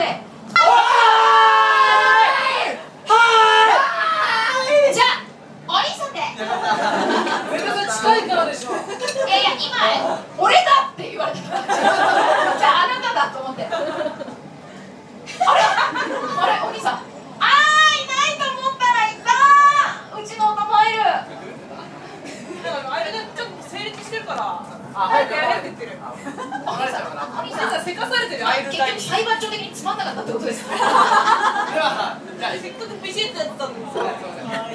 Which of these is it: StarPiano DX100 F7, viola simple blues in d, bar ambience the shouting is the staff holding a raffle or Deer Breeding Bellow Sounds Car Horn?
bar ambience the shouting is the staff holding a raffle